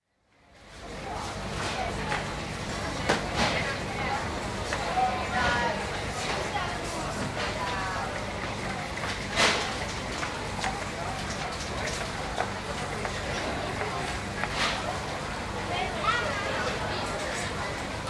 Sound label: Supermarket, Budapest, busy, with speech and trolley noise; BBC